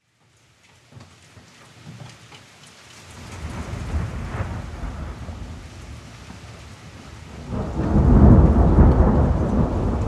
Thunder Real Ambi

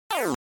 Arcade General Pitch

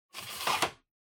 Bingo Machine Master Board Insert

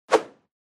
Motion Swish

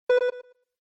SNES Fighting Select

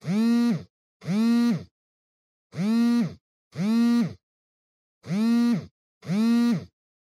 Cell Phone Vibration Cushion

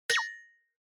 Onoma Surprise Low

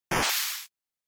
Defeat, NES RPG

